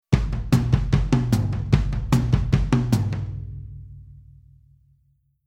Rhythm Rock n Roll

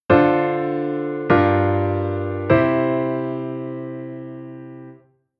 Chord Vm7

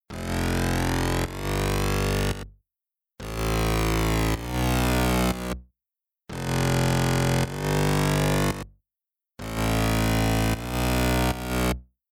Future Bass Bass Main

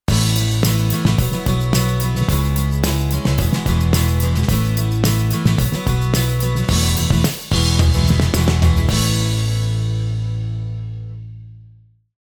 guitar

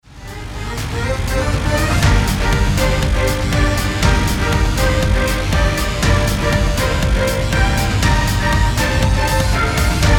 Strings Kizami All

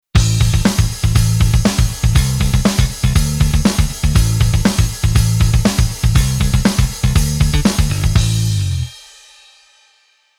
Bass Arr Rock

